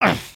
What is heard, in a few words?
Recoil; creatures organic